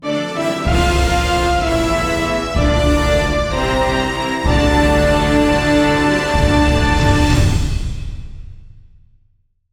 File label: Glorious Triumph, Fantasy Adventure Music; Free Fantasy Adventure Music Pack; Sample Audio Pack